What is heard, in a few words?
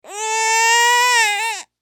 Human Baby Cry Single; Babies (Sound Effects:Voice)